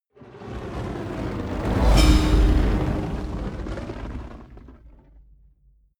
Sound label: whoosh magic fire bowl